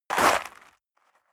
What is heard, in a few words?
step leather shoes gravel light jump; schritte